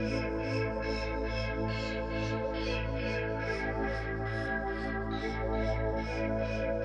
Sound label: TECHNO PAD 140; Keys